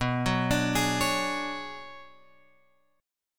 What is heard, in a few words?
B Minor 6th Add 9th B; Bm6add9 Chord {7 9 X 7 9 9} arpeggiated